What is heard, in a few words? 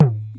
talking drum